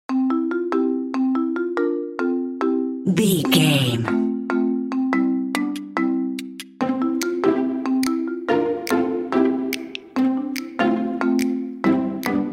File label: hap hap clappy narrative